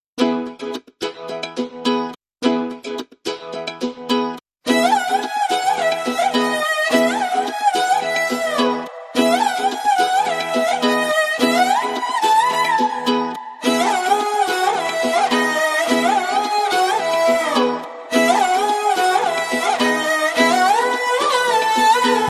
BGM Violin Ringtone

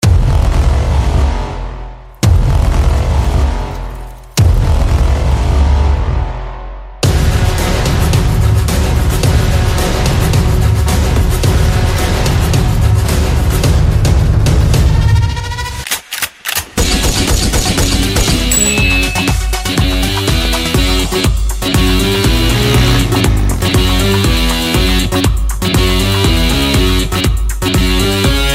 Instrumental BGM Ringtone; Tiger Zinda Hai

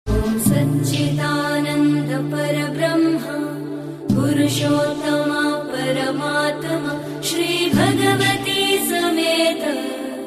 Mantra Ringtone

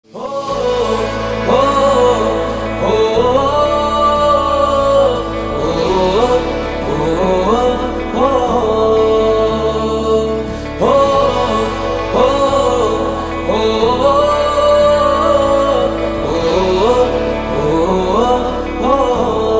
BG Chorus Ringtone

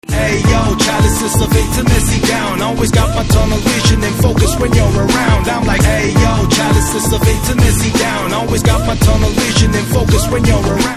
Ringtone

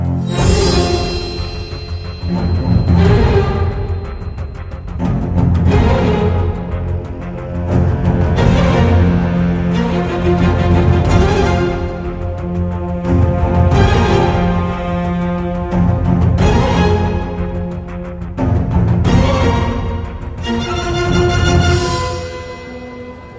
Ringtone

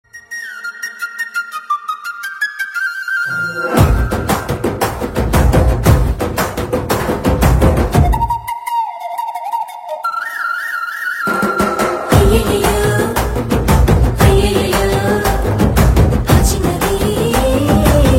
BGM Flute Ringtone

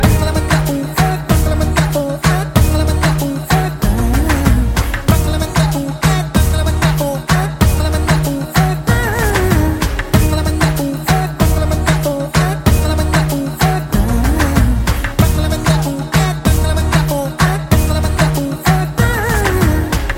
BG Tone

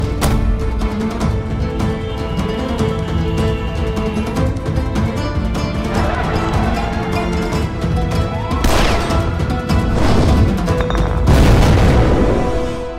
Instrumental Ringtone; Shikara A Love Letter From Kashmir

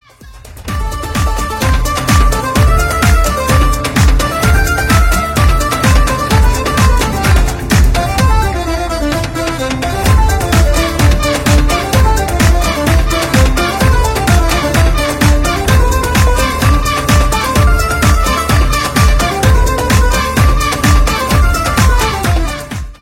Instrumental Ringtone

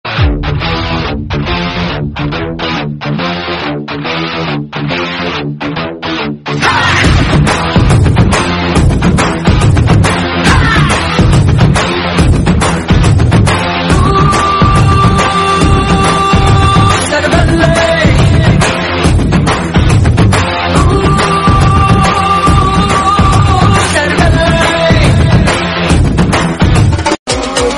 Instrumental BGM Ringtone; Oosaravelli

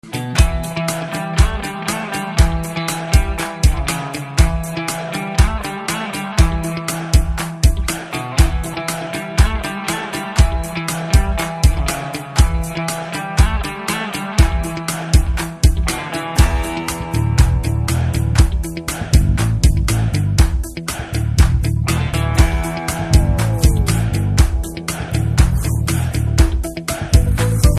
Instrumental; SANJU

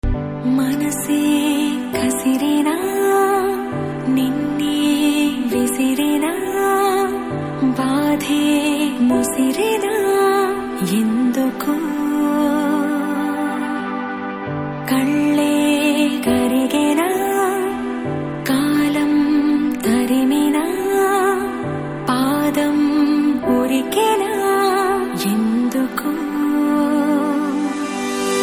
Slow Bit Ringtone

